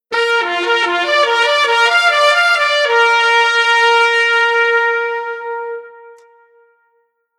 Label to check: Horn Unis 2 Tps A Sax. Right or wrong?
Right